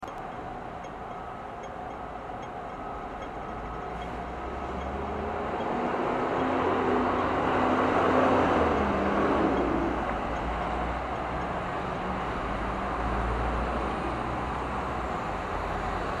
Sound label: general sound